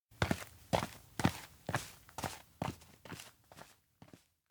steps indoor leave; meguriai; anemoi パッケージ版 1.1

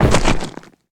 foley body fall; anemoi

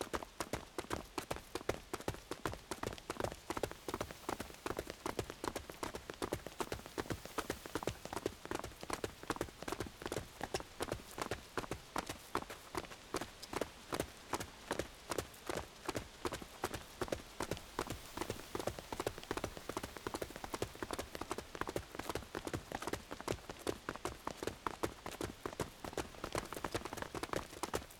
outdoor loghouse front jog; anemoi

